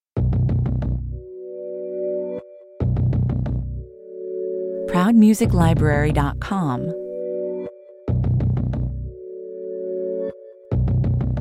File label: (no lead drums perc)